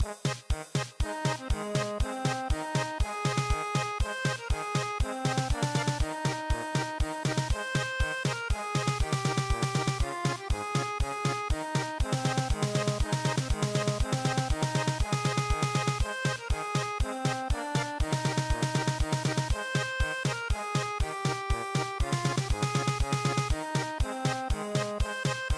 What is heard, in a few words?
German Polka, traditional; The Star Spangled Banner